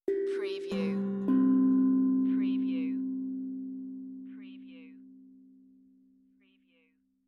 Tongue Drum Meditative Tone; beanstalk audio

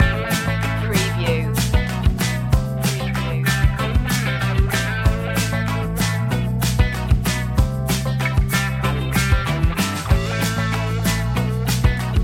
hot funky groove

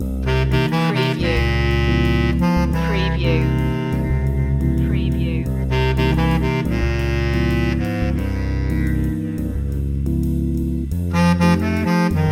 loop

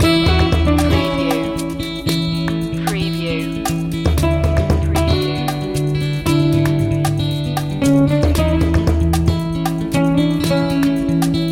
traveland loop

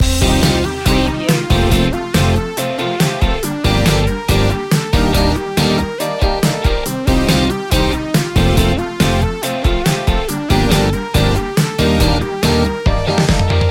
full city loop

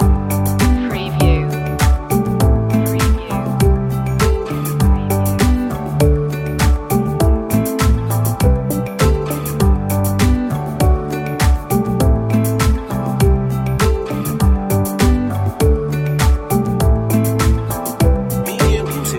sunset beach loop

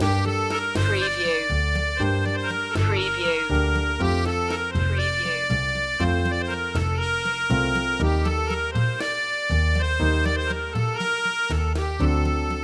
loop

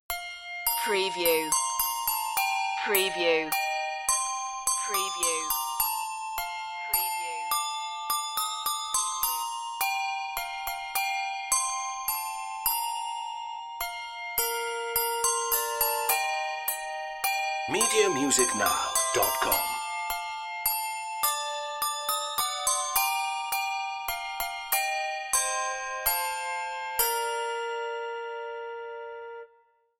we wish you a merry christmas handbells

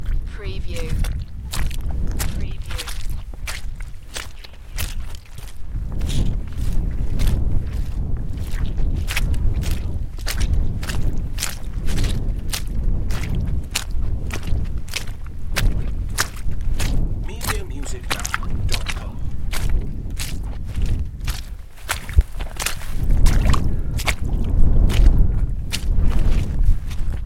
Hiking puddles and wind; beanstalk audio

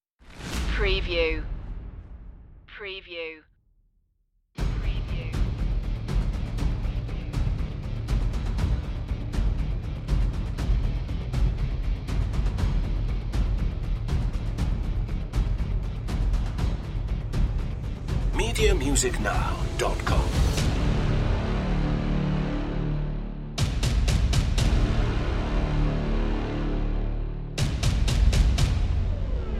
perc and fx only

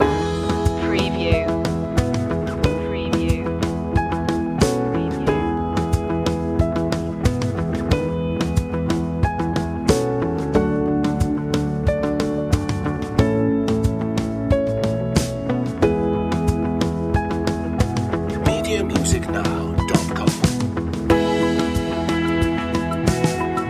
rumble in the jungle

